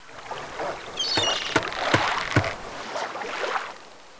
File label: SHIPYARD; Caesar III